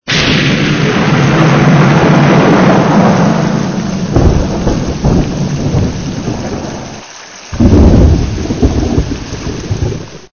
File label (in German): gewitter